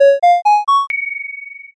heal

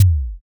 kick

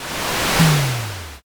fire magic

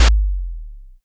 taiko

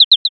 sparrow

